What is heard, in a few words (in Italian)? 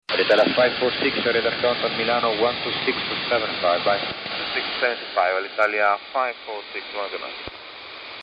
AM in banda aerea; AM: Amplitude Modulation - Modulazione d'Ampiezza